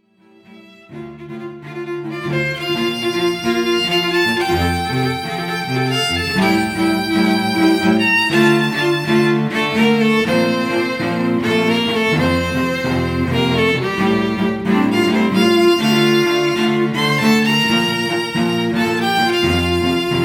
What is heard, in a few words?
String Trio